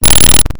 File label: chimes